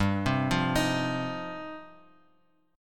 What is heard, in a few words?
G 7th Suspended 4th Sharp 5th, Bass Standard; G7sus4#5 arpeggiated